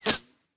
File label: bullet flyby pistol close; Rust